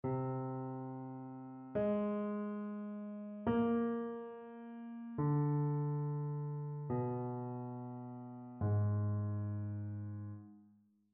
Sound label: interval chains